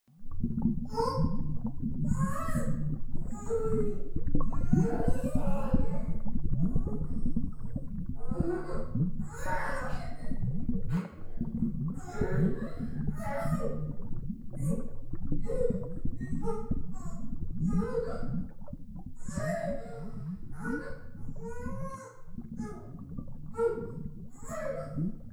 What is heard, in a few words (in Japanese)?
スライム窒息